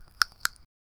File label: picking strawberry from b; garden